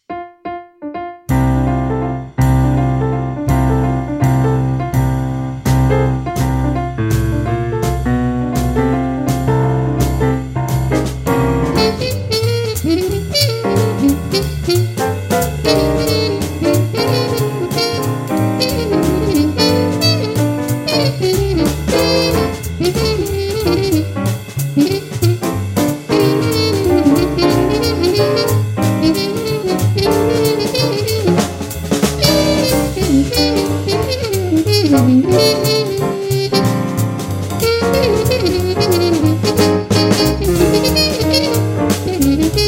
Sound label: bebop